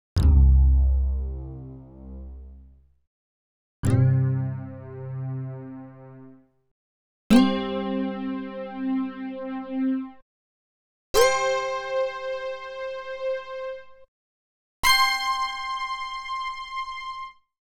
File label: Portamento C1-5; Klaus Schulze Collection